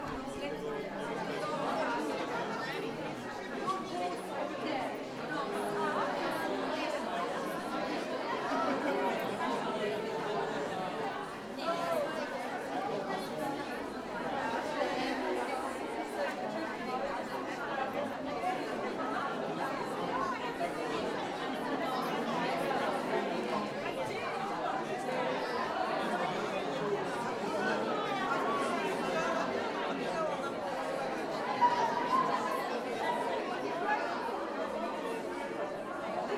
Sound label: background main loop, music; The Jackbox Party Pack 2 - RU